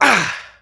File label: dad grunt; Ringling Bros. and Barnum & Bailey; Wii